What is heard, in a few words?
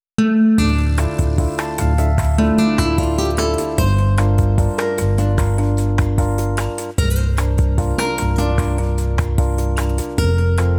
Bossa Nova